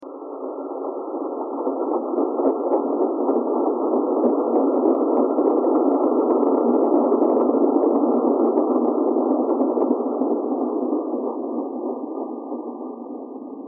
thread noise

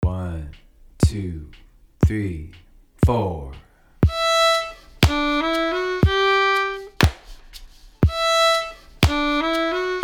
Frosty Morning; fiddle

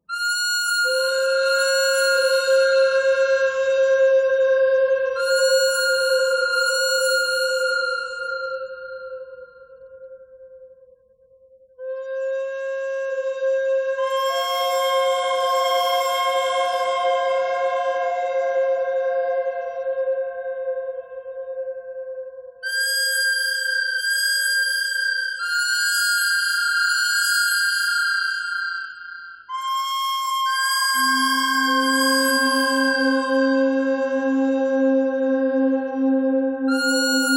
bird thread simple; noreverb

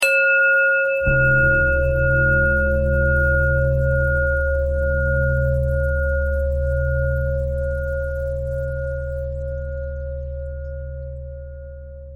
bell bell thread simple, bells

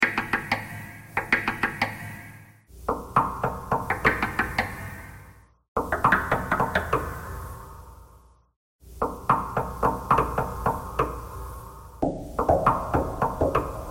knocking thread noise

